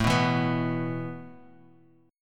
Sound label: A Suspended 2nd A down, chord; strummed; JGuitar